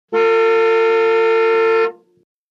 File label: Car horn, 1970's ext.